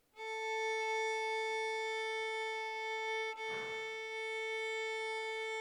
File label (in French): viol la